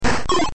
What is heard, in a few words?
full line, tetris